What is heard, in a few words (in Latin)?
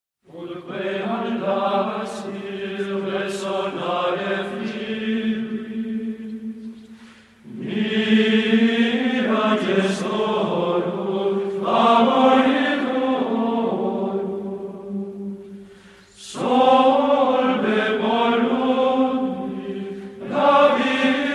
Ut queant laxis